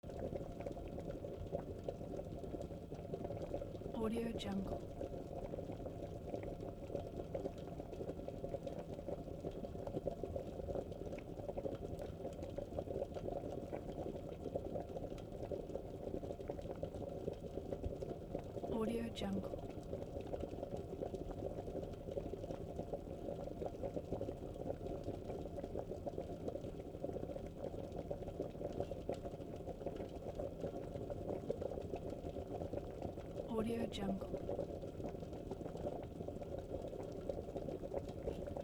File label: boiling